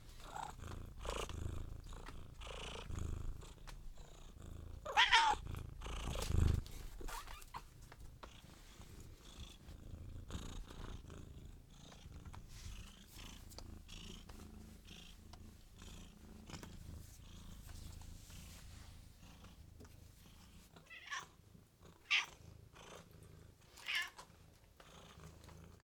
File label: House cat purrs and meows; Sound Ex Machina